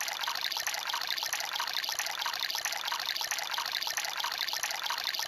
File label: Stream loop, Yamaha DTXpress, Drum Loop